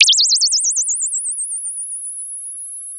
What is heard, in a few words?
Sound of a bird tweeting nicely